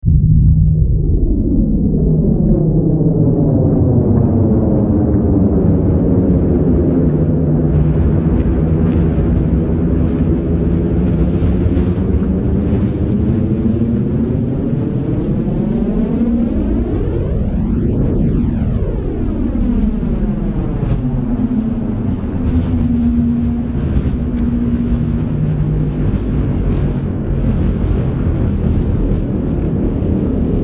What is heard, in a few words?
Wind